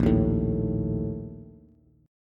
A sharp Major 7th Suspended 4th, Bass; strummed; JGuitar

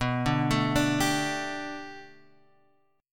D Suspended 4th B, guitar; Dsus/B; arpeggiated; JGuitar

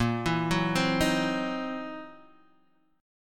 C 7th Sharp 9th Flat 5th

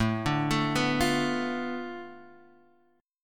B Minor 7th Flat 5th A

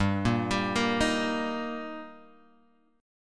G Minor Sharp 5th G, Guitar, Open G; chordshape; down

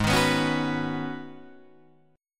G sharp Minor 11th G sharp Guitar Drop D, chord

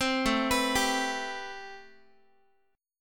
A Minor 9th Guitar; Am9; arpeggiated; chordshape; 15,17,14,17,x,x

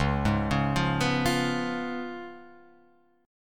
Db 7th Db down Guitar Open F; Db7 arpeggiated; JGuitar

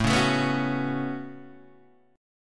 A 6th Add 9th A down Guitar Open D

